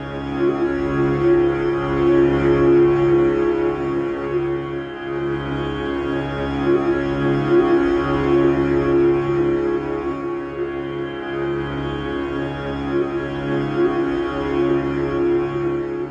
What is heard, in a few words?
big sitar; Far East Drones and Plucks